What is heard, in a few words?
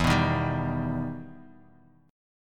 E Minor Major 7th E down; EmM7 strummed